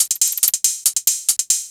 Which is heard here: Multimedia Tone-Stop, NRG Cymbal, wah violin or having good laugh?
NRG Cymbal